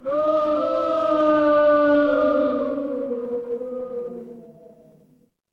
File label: crowd boo